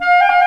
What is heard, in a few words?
THE CLARINET